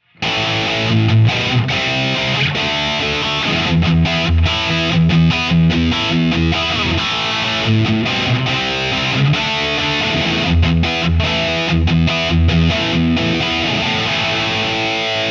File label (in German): Gitarren Plug ins im Vergleich S Gear Highgain Rhythm